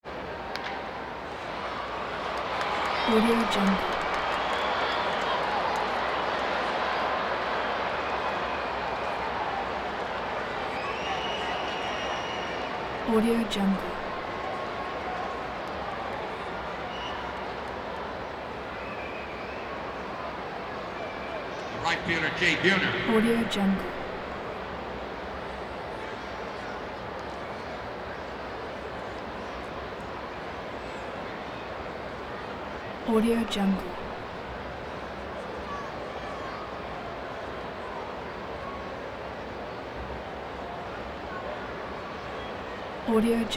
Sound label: baseball crowd bat crack hit mixed reaction